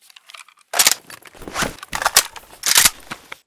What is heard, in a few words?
abakan reload empty; STALKER; IWP